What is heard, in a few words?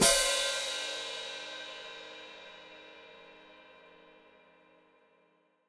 Hat Closed Open, Hi-Hat